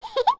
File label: Baby Goron Giggle; Baby Goron giggling (3 pitches)